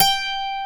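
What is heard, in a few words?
12 STRING G3, guitar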